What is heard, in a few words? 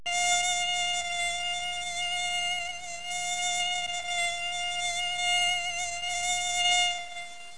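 mosquito buzzing; kunchong jiaosheng; wenzi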